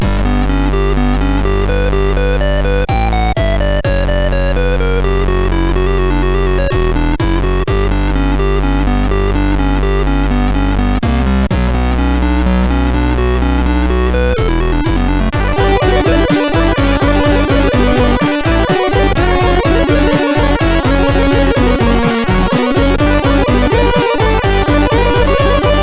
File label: Up-Tempo Rock Remix, MOS6581R2